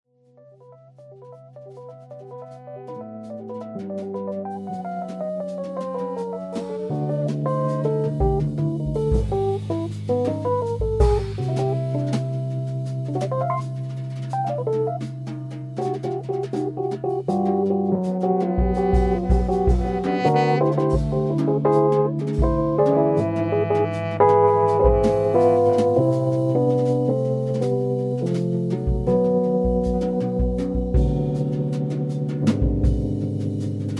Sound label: call loop